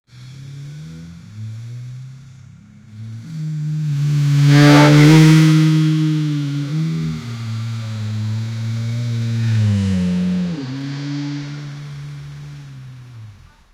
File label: deep aggressive exhaust, backfire